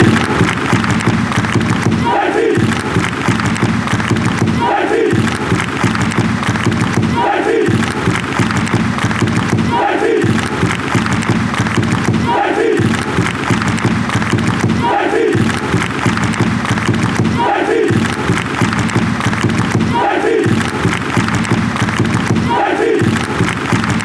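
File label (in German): mit Schlagzeug, football fan chants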